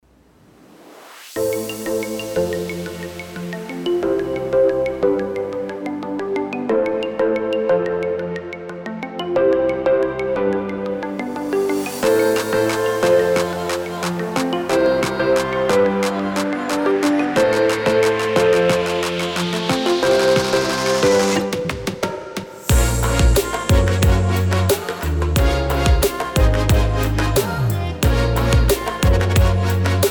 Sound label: BGM